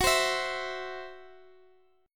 E Suspended 2nd Sharp 5th

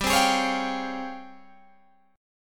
G sharp Major 13th

